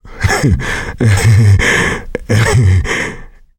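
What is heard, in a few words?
laughter, male; survivor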